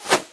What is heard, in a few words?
hop, antlion guard; hl2